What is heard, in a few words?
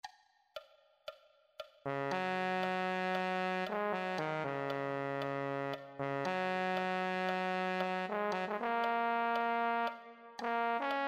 euphonium